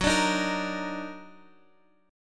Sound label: Eb Minor Major 7th Gb, chord